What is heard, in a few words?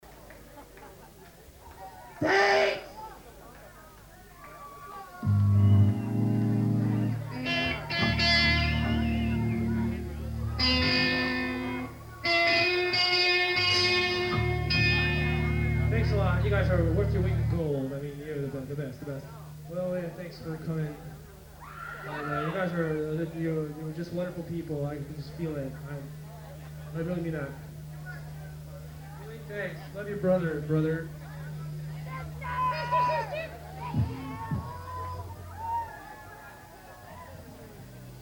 Tuning and Banter